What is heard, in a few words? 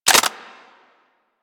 Dry Fire Heavy; Weapons